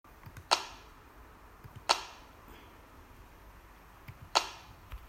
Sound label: Clothes dropping